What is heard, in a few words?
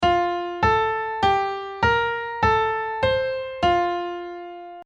Playing in F; piano quiz